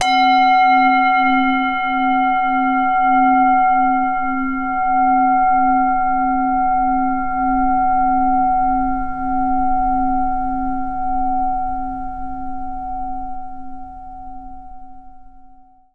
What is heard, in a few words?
zen prayer bowl; Garry's Mod; tf2; japan fundraiser